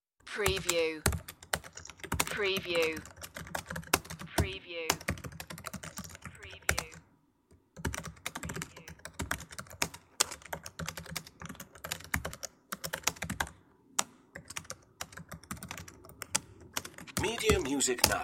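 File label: Typing Fast; Computer sound effects.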